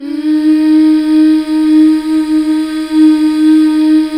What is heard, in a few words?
MMHH, BACK. MMMHH Fem